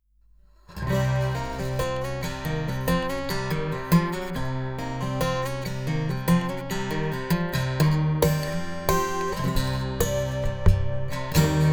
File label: tap Piezo Eq; タッピング奏法; ピエゾ; ここではいわゆる"タッピング奏法"のフレーズを弾いていただきました。; ピエゾEQ; Santastic